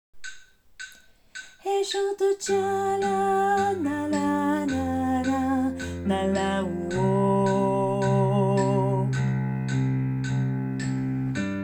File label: soprano